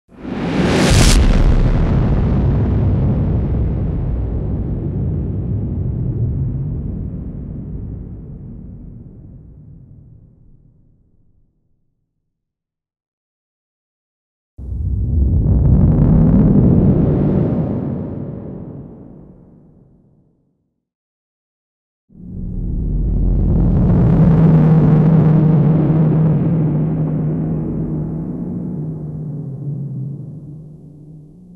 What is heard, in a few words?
Meteorite